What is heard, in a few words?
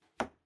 archery foley arrow impact